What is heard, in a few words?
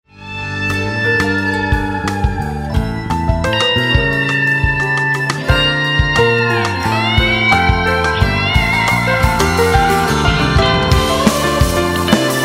Ringtone Instrumental